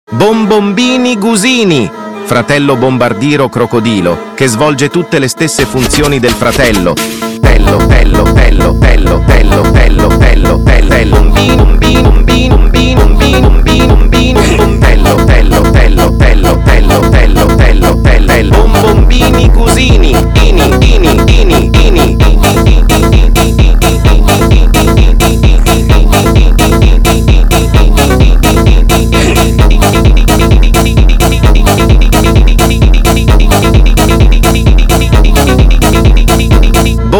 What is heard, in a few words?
funk